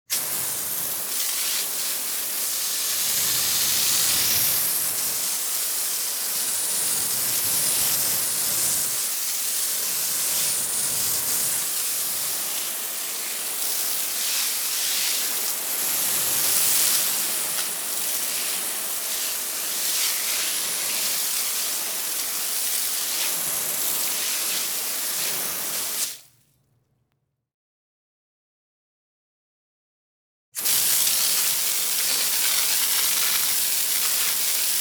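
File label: watering the garden with a hose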